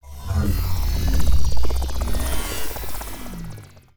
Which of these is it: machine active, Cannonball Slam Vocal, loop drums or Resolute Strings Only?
machine active